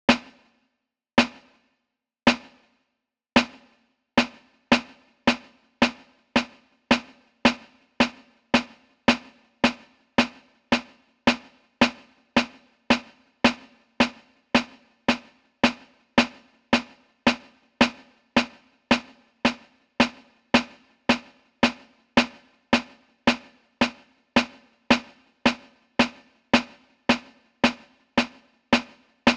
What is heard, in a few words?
snare